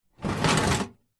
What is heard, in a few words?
metal toolbox with tools inside tilt movement